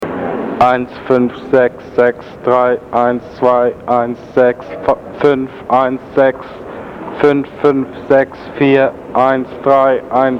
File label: shrine dictorphone mexico, street recording